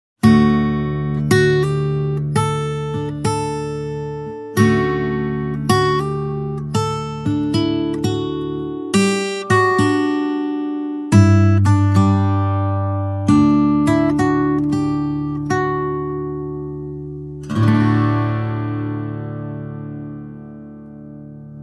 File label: Main Guitar Only